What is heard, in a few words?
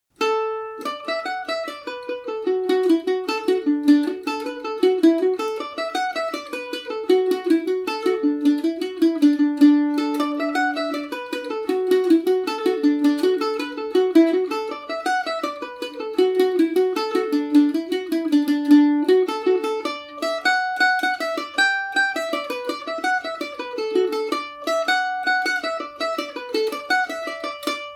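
munster buttermilk d major, mandolin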